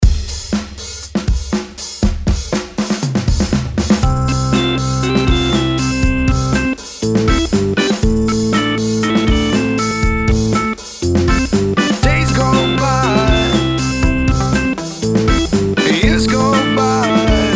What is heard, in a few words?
pop